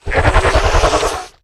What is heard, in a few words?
breath; gonarch